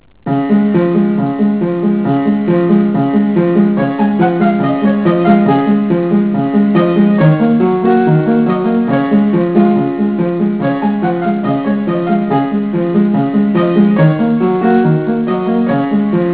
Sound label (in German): klavier